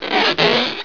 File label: RUBBER; WATER